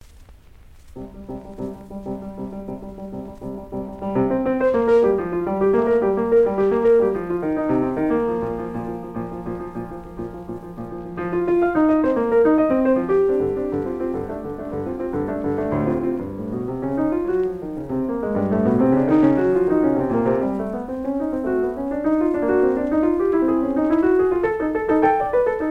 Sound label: Dance in Bulgarian Rhythm; Book VI; Béla Bartók; Mikrokosmos, Sz. 107